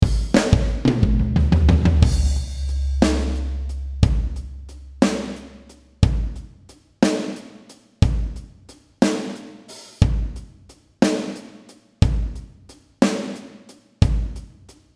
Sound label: Big Band Leedy Kit; Superior Drummer 3